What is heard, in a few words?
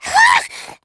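spitter pain short, zombie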